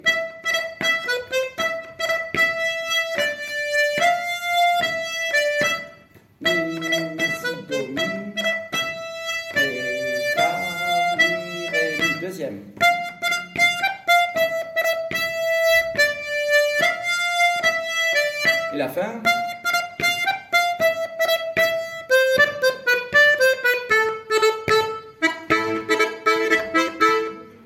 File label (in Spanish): fandango; Musique d-ensemble